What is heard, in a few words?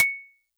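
Xylo Snare DX100 C sharp 7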